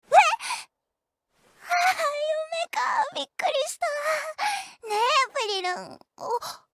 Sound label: backwards reverb